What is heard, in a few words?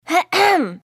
"ahem"; pony; Twilight Sparkle